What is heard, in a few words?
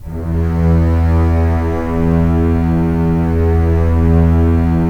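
STR CBS, Strings; Roland - LA Composer vol. 4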